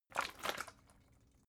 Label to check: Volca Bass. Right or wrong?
Wrong